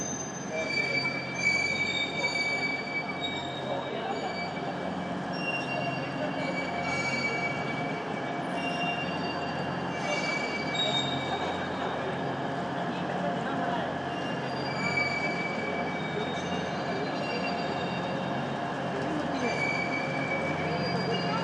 singing squeaky escalators at london kings cross station